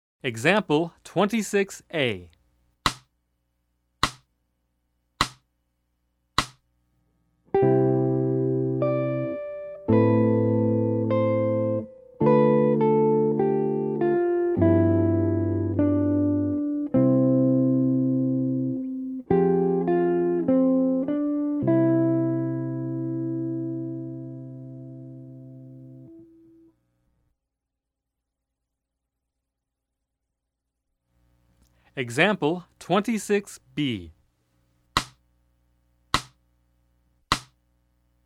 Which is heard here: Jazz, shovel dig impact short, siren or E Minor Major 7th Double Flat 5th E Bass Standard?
Jazz